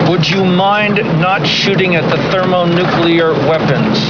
weapons

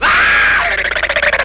ahhhblbl

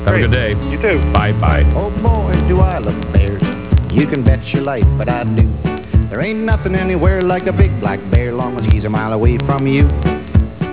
bear song

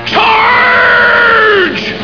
charge

